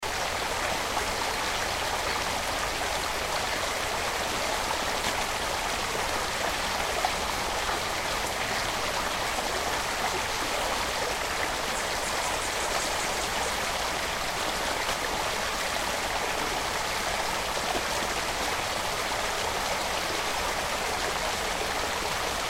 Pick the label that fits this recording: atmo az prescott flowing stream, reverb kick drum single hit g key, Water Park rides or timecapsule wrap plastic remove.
atmo az prescott flowing stream